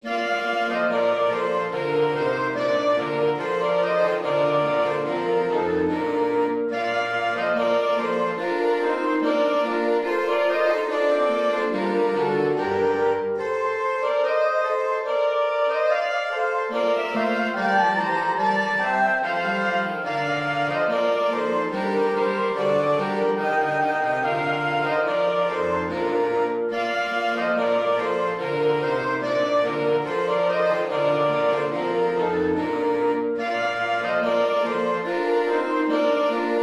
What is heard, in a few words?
deck the hall